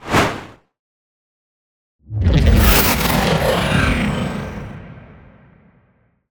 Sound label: Ice Spell Mobility Teleportation Chilling Teleport; Mobility & Teleportations; Arctic Aura